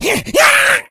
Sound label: rage, infected, male